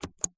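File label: computer keystroke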